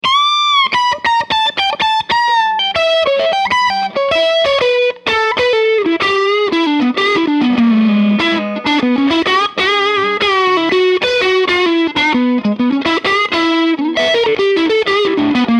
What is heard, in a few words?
Fender Strat; Modified Trainwreck design; 4 El84 Output tubes; Mercury Magnetics; 28 Watts